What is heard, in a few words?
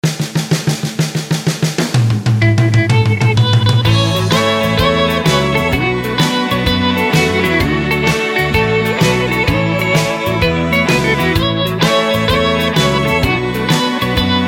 A No Backing Vocals, backing tracks